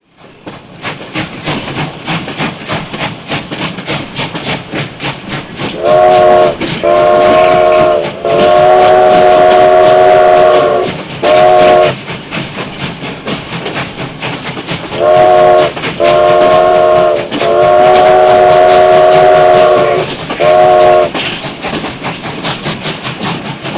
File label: Train Sound